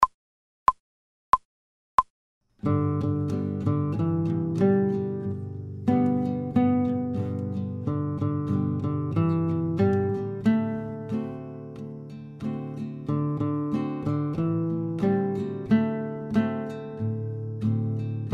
slow without metronome, guitar

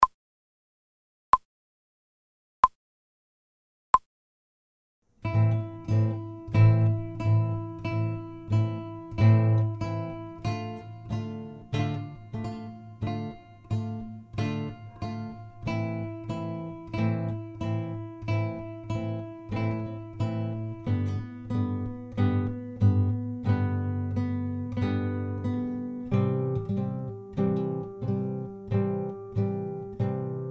slow, guitar, without metronome

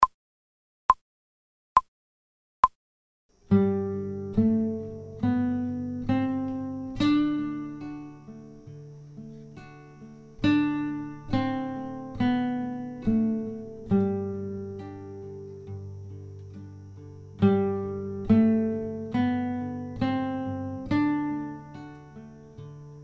slow; without metronome; Pumping Iron

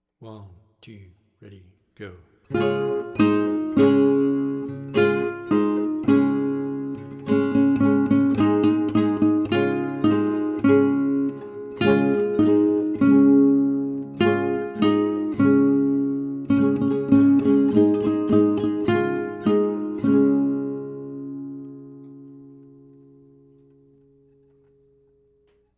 in Db, guitar; Hot Cross Buns

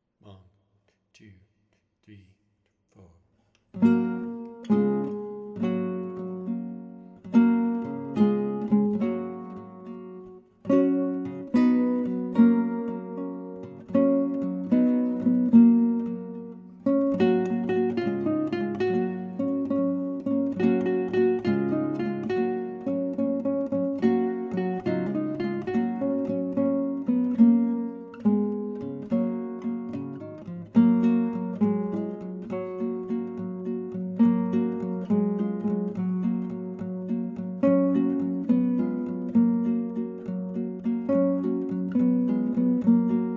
in F, guitar; Three Blind Mice

